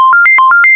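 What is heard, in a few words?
ui push to talk ringback; Motorola VA76r Tundra AT&T; ui sounds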